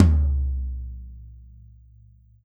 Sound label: 16 hi, Drums&Percussion; E-MU Producer Series Vol. 7 – Old World Instruments